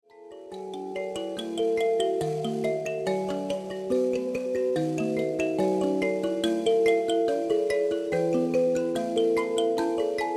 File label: mbira